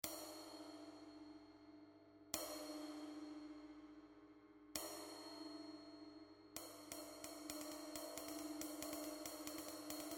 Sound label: 22" Master FLAT Ride, Bosphorus; cymbal; 2300g